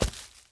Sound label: footstep walk vegetation